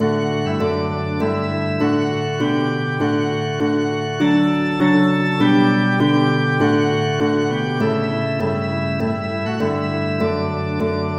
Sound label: polyinstru; hymns; Himnario grande